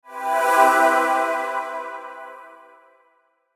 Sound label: Sweeper Vox Pad; Swell; Blastwave; Production Element Imaging Element Accent Transition